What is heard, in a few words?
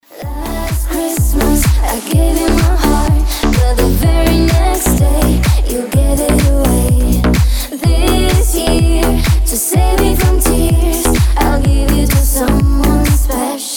rington christmas heart